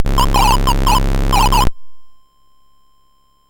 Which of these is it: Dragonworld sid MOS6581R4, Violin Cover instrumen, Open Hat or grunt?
Dragonworld sid MOS6581R4